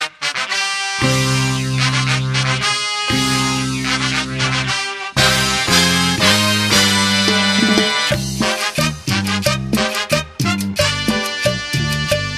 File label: CUMBIA; NFL FOX